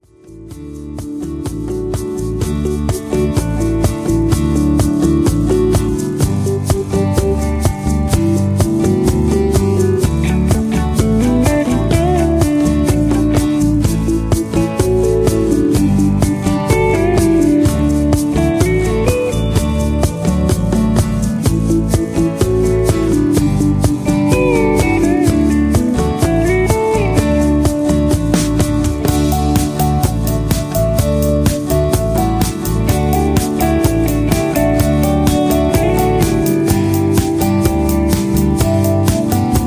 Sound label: Instrumental with Melody; Singing Calls